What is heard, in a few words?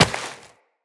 Step Rock, Footsteps, Walk; Tenno